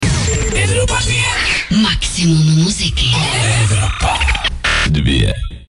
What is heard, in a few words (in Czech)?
jingl